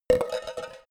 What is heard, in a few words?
plastic handle